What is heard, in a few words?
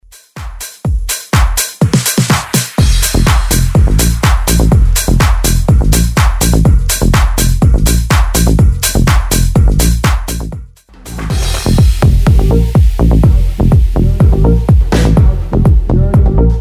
Future House 124bpm